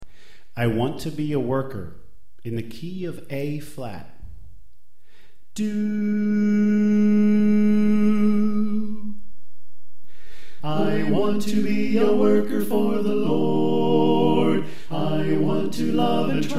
Soprano Left

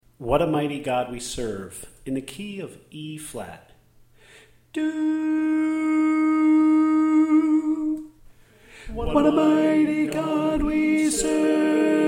Alto

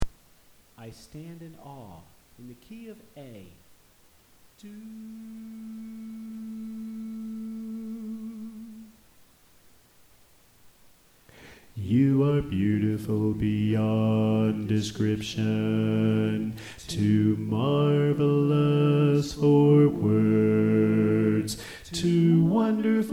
Bass

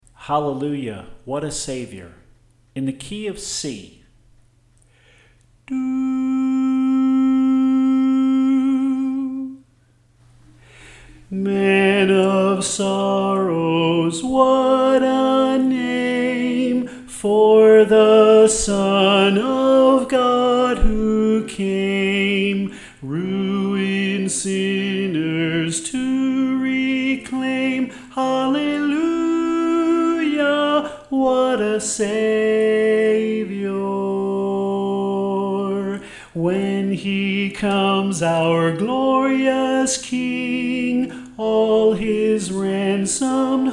Soprano

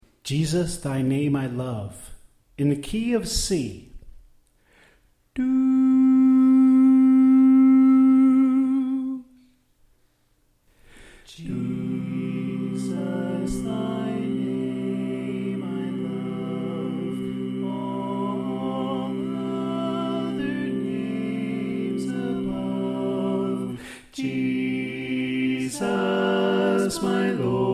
Bass Right Tenor Left

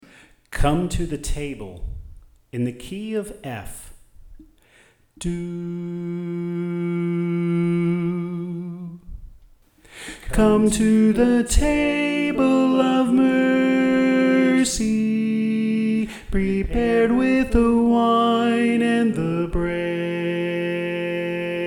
Tenor

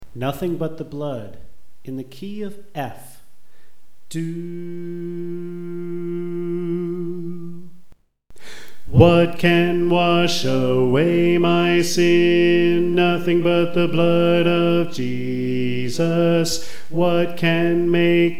Bass

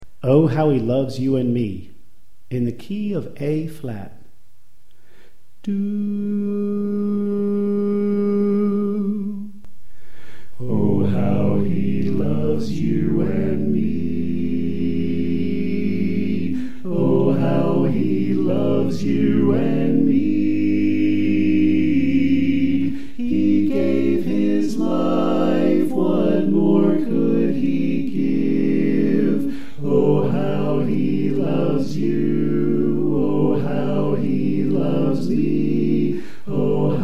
Bass Left

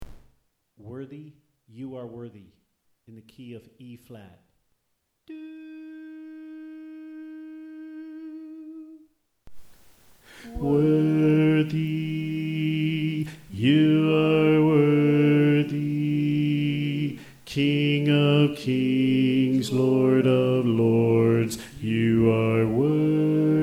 Bass

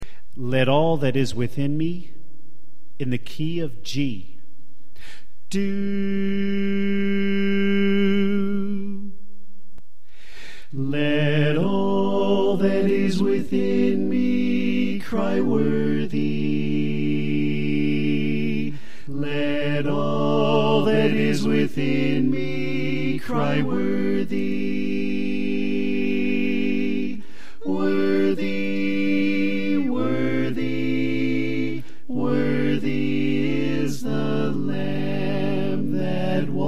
Alto Left

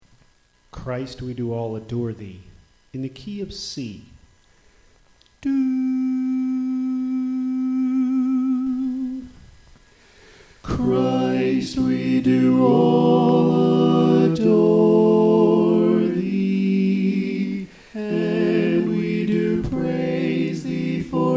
Alto Left

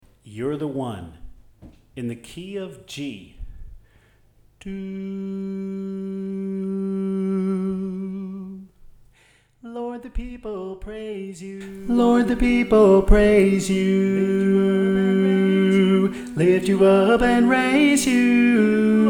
Soprano

